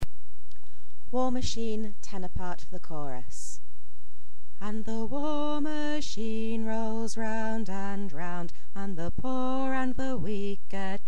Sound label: war machine tenor